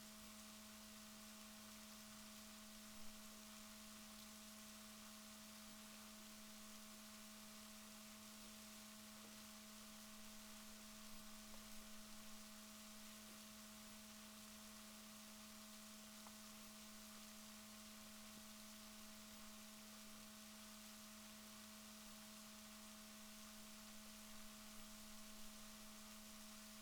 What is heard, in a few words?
rorquals positif, Balaenoptera Physalus Chile